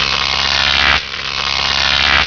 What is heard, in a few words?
sabotage activate, sentry; buildable